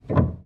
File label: piano release